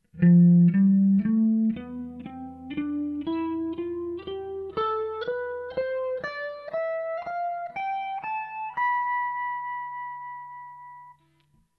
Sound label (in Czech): lydicka, kytara